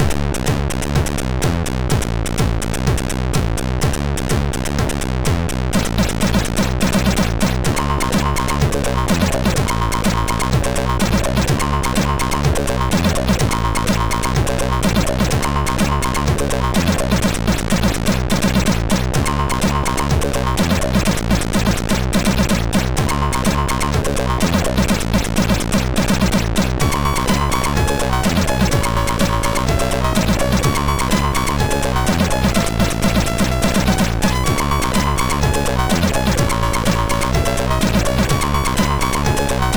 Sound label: menu, sid MOS6581R2; Future Fighter